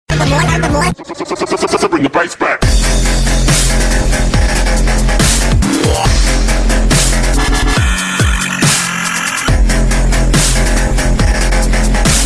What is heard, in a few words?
bass remix ringtone